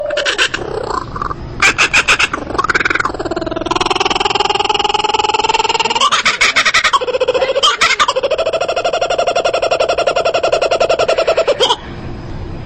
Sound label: Kingfisher Bird